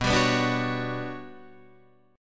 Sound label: G Major Sharp 11th B, chord